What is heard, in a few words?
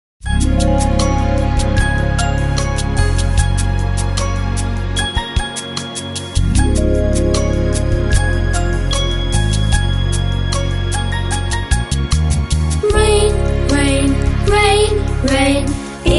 Rain Rain